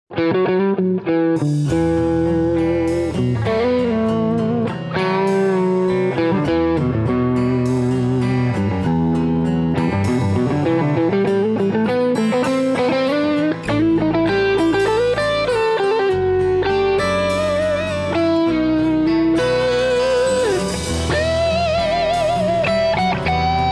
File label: Heritage H150 WCR Crossroads